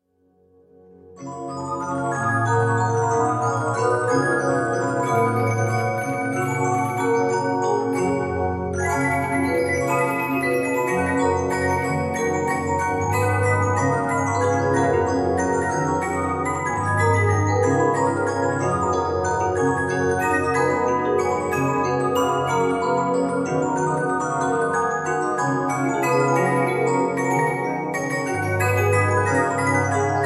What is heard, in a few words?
Christmas Waltz